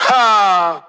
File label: "ha", Voice; ooh ahh